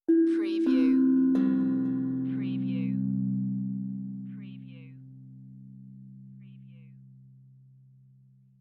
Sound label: Tongue Drum Meditative Tone; beanstalk audio